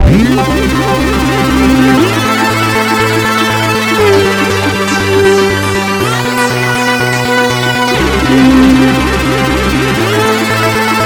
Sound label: Arcade Attack Intro